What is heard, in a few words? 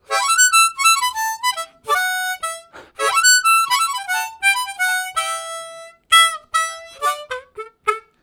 Fast, Harmonica Riffs; Zero G